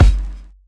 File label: Kik; Rawcutz - Nu Forms